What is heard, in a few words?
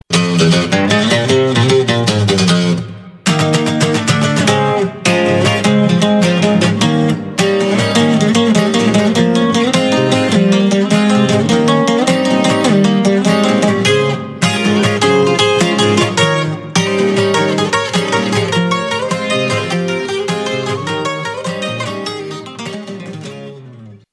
guitar ringtone